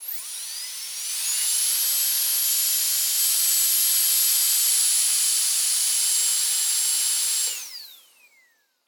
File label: Dentist Drill; Medical; Blastwave